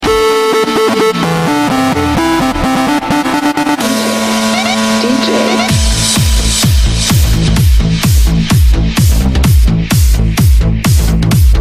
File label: Electro